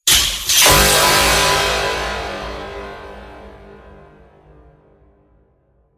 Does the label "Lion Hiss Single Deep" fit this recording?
No